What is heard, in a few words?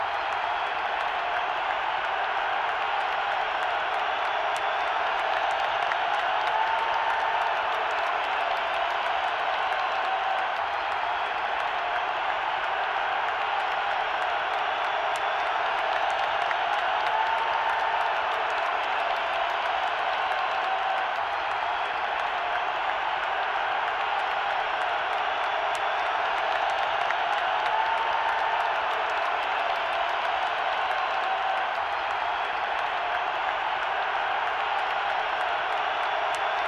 arena loop